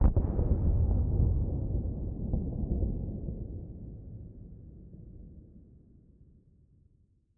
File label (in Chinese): 玄武岩三角洲地表声; ambient